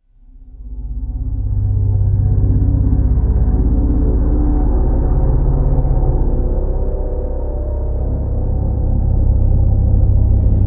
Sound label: Drums Short Fuse